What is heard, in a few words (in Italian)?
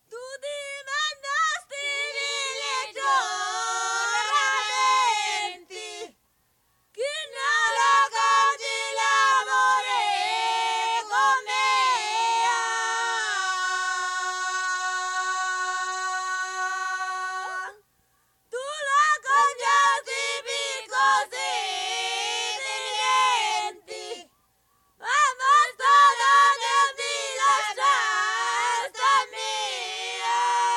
canto di mietitura; tu ti vantasti vili e cora nenti; Cardeto; Mammola; Cinquefrondi